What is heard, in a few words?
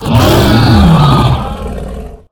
Bronze Dragon Death